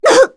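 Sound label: Damage, Korean; Veronica; kings raid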